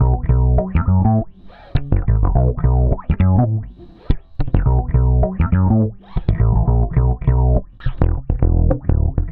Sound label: 103 FUNK BASS; 103 BPM FUNK B; Drumdrops; Compact Disco